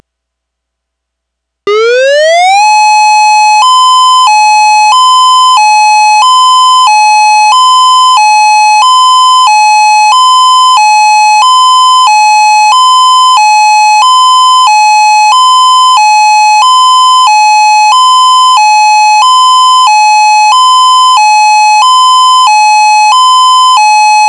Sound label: All Clear alternating steady